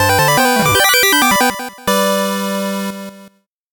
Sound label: 8bit jingle fast